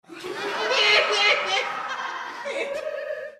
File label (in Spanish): risas